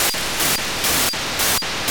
SUOMI100 437,775 9k6 GMSK (FM) NanoCom AX100, each 4x beacon type 0 and type 1